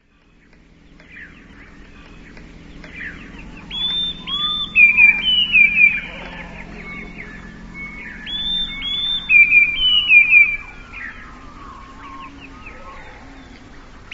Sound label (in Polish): spiew; w dwukrotnym zwolnieniu i obniżeniu o oktawę